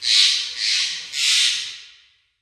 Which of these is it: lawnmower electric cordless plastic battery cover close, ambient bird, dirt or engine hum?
ambient bird